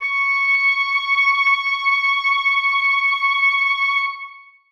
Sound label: oboes sus c#6; Sonatina Symphonic Orchestra